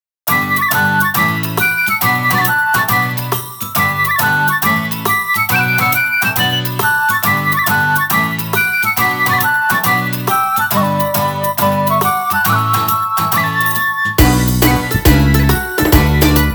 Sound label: instrumental